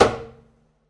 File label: pizz noise body slap, double bass